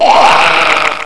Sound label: gurp; critterz